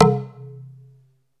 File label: PERC C2; SEQ AND PERC; Music Store - Claudius Diemeter Schwerlos